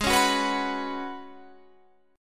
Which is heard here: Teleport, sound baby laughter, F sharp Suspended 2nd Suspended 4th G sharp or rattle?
F sharp Suspended 2nd Suspended 4th G sharp